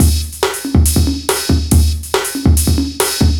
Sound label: Beat, Drums